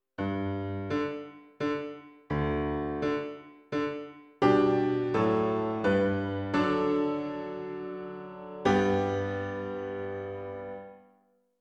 Piano

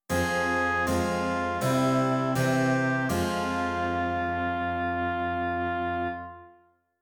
continuo, dictation

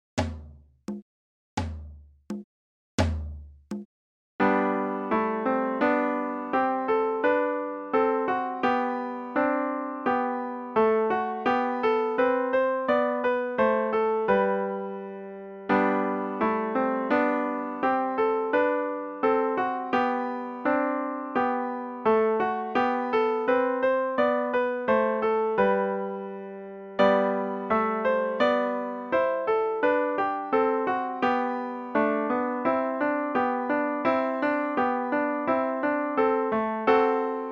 Musette Eng Suite

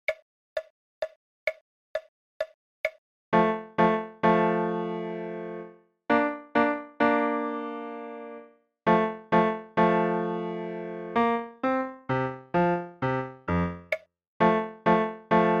Leopold Minuet LH

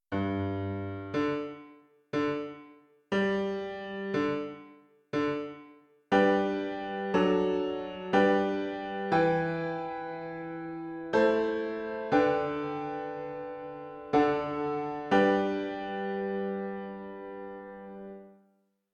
Piano

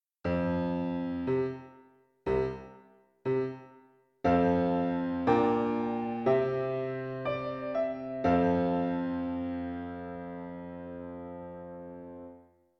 Piano

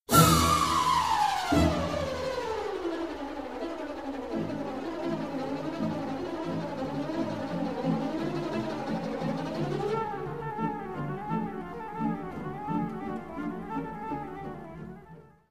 Chrom scales; Bumblebee

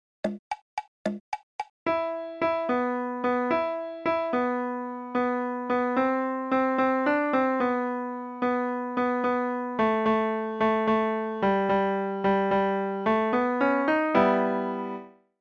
Tarantella

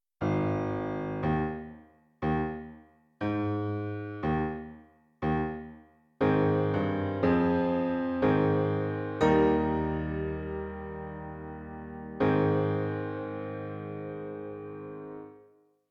Piano

